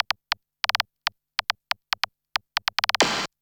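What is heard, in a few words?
SNARE ELECTRO 140, Techno Drum; Techno2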